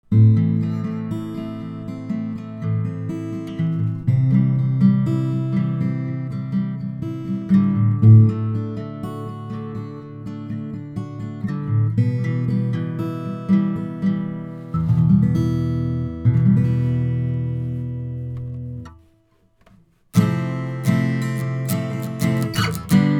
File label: antelope zen go guitar